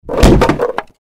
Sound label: you Won Panel Appear; tiki-beats; eyecon